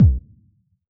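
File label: bass drum T9 Distortion, Yamaha DJX; 155 DJX Kit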